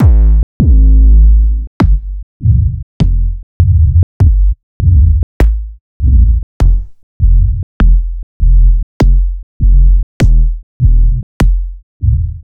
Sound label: Techno Kicks; M8; Sliced